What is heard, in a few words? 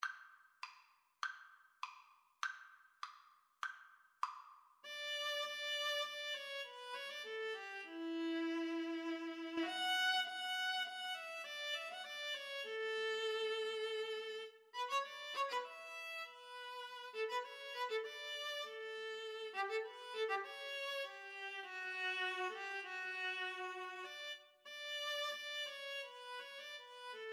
viola viola